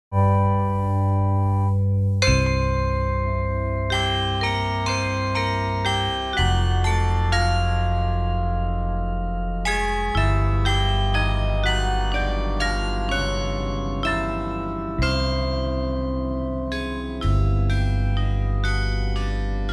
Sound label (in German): Klimaglocken Playback